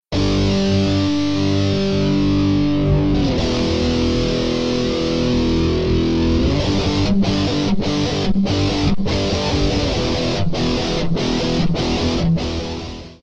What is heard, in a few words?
flanger example, electric guitar